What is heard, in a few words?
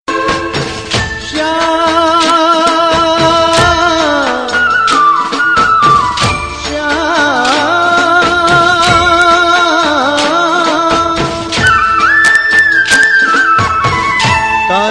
Gujarati Garba